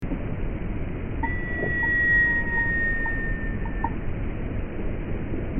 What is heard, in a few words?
Dust Shaker -50 semitons; E300 EVOLT